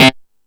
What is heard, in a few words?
Killer Synth, E-MU Pro-Cussion